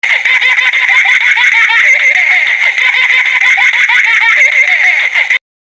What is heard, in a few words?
baby laugh remix low quality